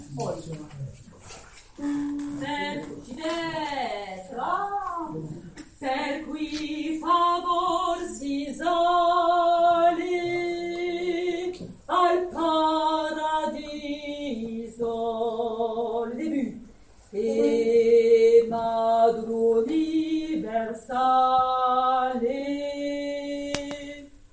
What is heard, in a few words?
Dio salvi regina